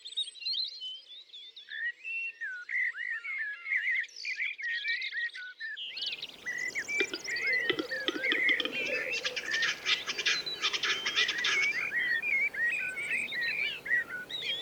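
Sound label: capercaillie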